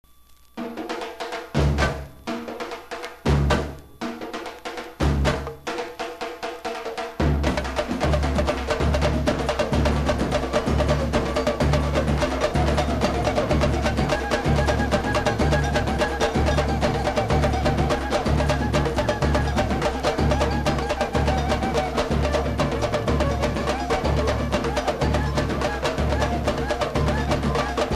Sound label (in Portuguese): bateria